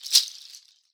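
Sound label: shaker, Synclavier Percussion, World & Orchestral; Synclavier Percussion 2; ILIO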